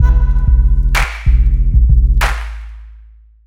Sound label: Beach beat